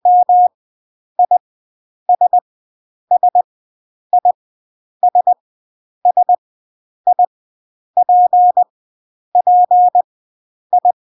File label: mississippi, Farnsworth, cw